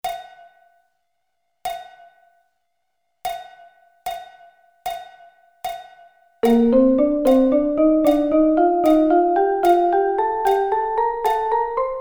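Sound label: B flat Double Sticking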